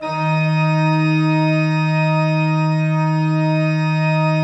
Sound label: PLENO D3, Cathedral Organ; ILIO; Propeller Islands